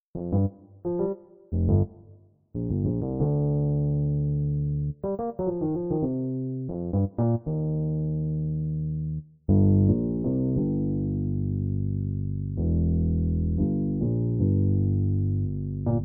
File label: Fndrky Bass